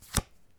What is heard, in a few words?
card Slide, Casino; Kenney's Sound Pack